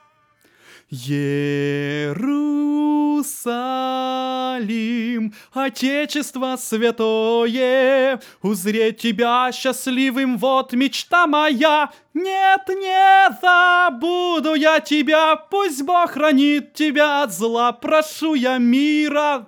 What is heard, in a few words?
alto